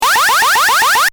Retro SFX loop, Retro Style Loop, Generic Game Style; Tech; Tech Sound Effects; Tech (Sound Effects:Science Fiction); Stuart Duffield